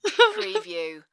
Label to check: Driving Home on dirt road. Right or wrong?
Wrong